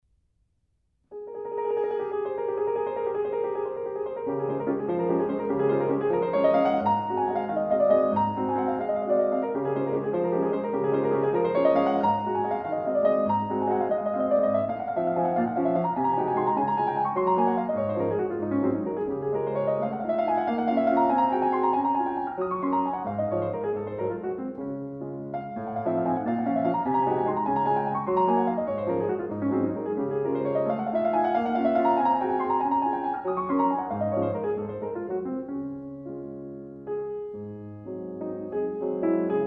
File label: Waltz in D flat major, piano